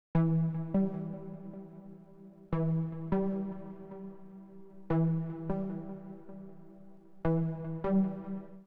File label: Wave attack Melody